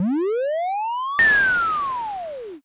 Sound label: beam cannon